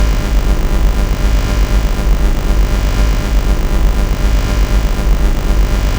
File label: Tone; Generated Tones; a sounds adventure through the intranet